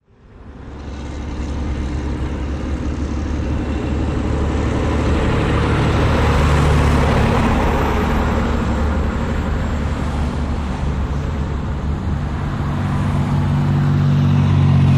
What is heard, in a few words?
driveby truck, diesel truck